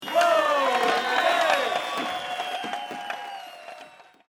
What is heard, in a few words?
game win; Casualino; Spades